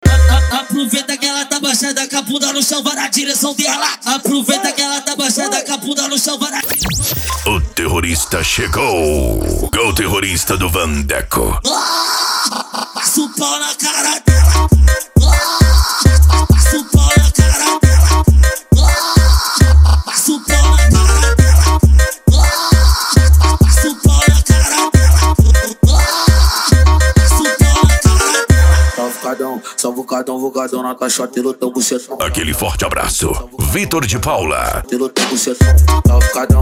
dance e funk mega bass

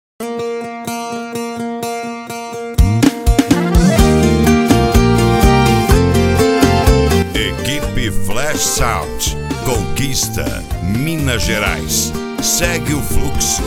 sertanejo; cd sertanejo flash sound

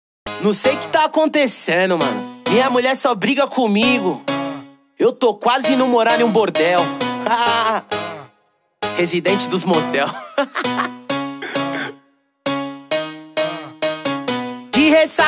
explode funk

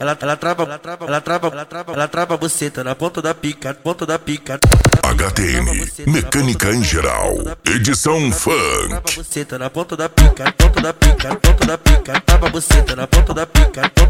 mecanica em geral edicao funk

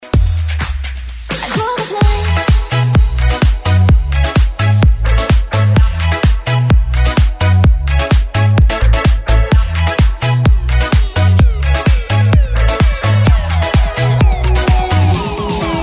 dance e funk retro; branco som

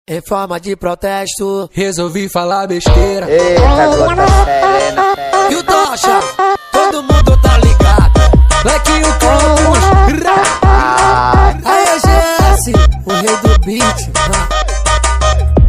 funk brega

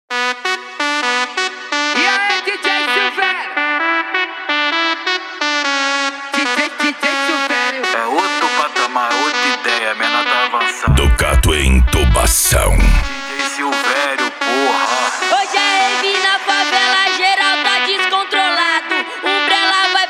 funk agressivo; ducato entubacao volume 01 esp

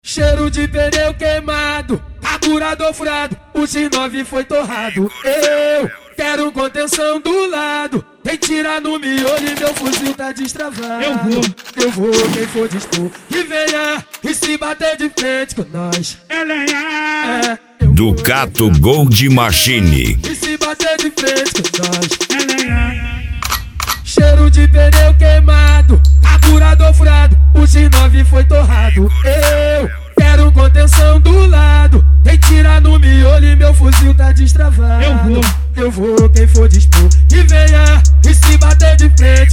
funk agressivo do sul

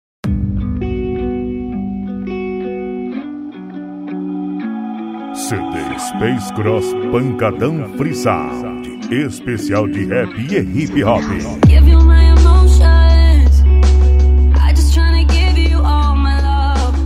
pancadao rap e hip hop

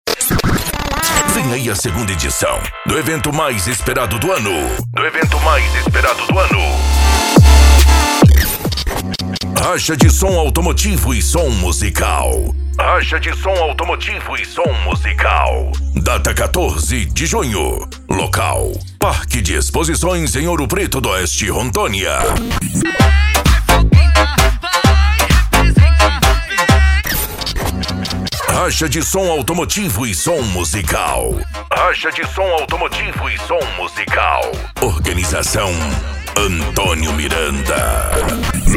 racha de som automotivo e som musical

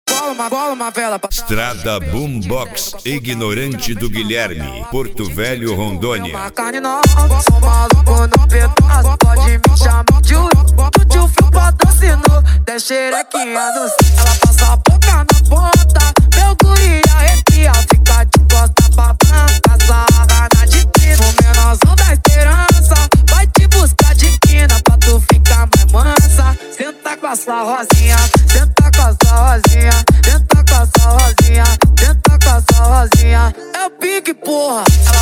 esp funk atack

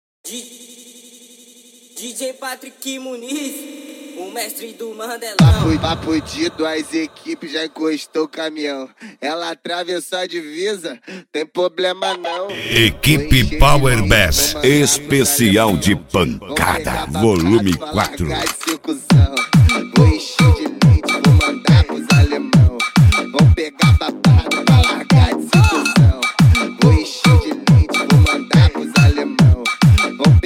pancada; equipe power bass

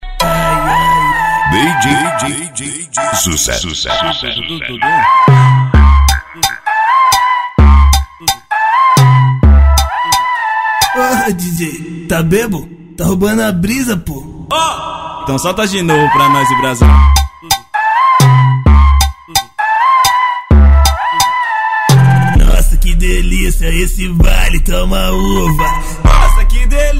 especial funk

